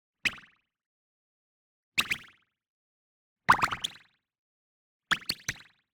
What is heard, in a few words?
Digital Click Positive